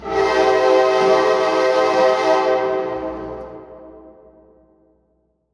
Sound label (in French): sifflement train